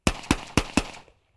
m14 rifle distant shots

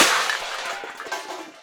metal crash, metal pots crash

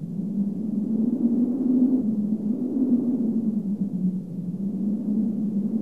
wind howl

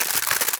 paper wrap

